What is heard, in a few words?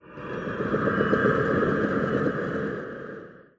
amb prepper rhythmic, ambient; Half Life Model Pack